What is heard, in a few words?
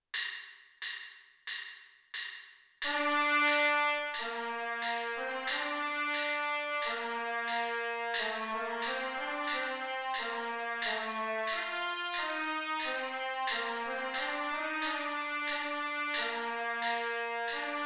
trumpet part b